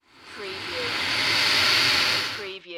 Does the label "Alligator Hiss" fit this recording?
Yes